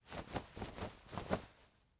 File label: Wave A Hand; LOOPERS